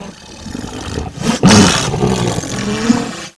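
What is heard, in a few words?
hvoice wavetable; monsters infect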